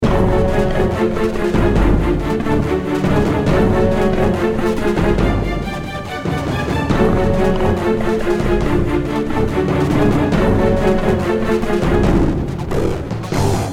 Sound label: Action Loop; Chrome